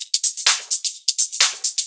Electro Loop 128BPM; Vengeance Samples

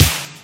Snares; Vengeance Samples; VEH2

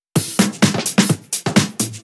Fill 128 BPM; Vengeance Samples; VEH1 Loops

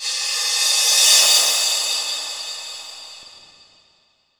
Reverse Cymbal; Vengeance Samples; VEH1 Cymbals

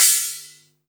Ride, Cymbals

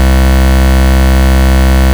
Ultimate Bass Root C; Vengeance Samples

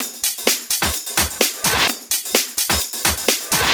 Houseloops 128BPM; Vengeance Samples

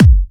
Bassdrums UK Trance Kicks; Vengeance Samples; VEC2 Bassdrums

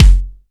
Soft Kicks; Vengeance Samples

